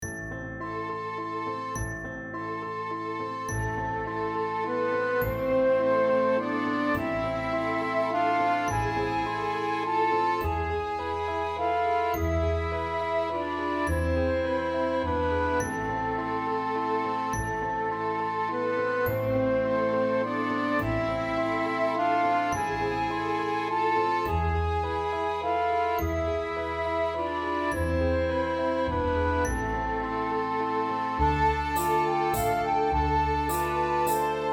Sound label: Harmonic Waltz